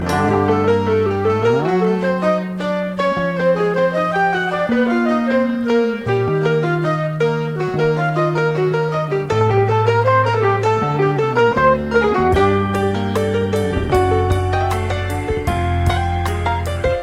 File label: Piano Solo